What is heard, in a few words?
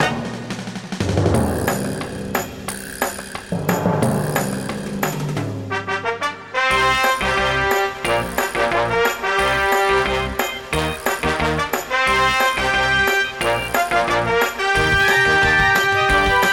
GuitarMusic